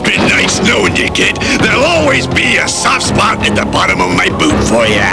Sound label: Boot